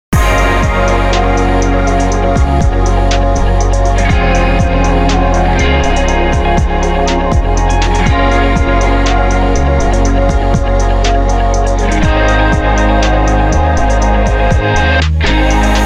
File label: Ambient